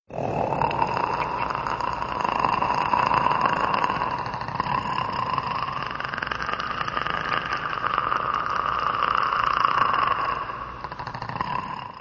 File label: Predator Snarl